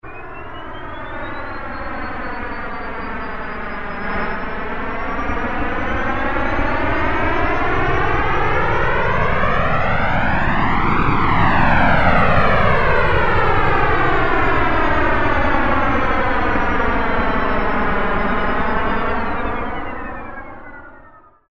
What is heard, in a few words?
Jet Chord, Roland Alpha Juno-2; Factory B